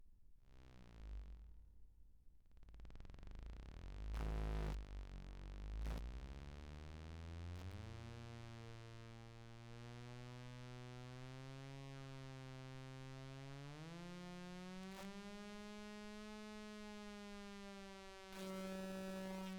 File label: buzz saw